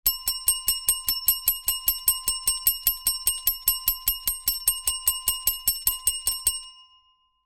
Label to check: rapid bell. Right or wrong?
Right